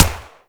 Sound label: Viper Fire, Weapons; Warframe; Vipers